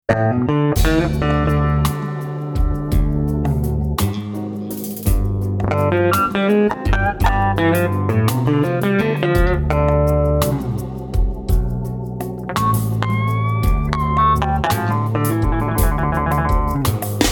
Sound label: Blues Clean, Jazz Guitar